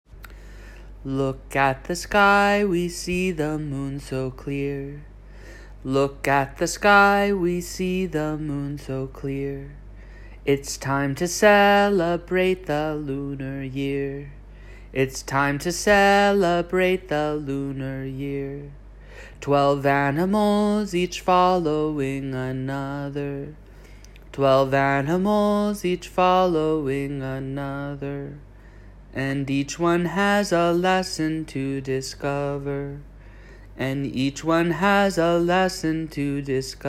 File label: Rabbit song for learning